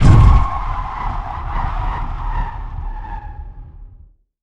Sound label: Aircrafts Gear Hard Touch In; DCS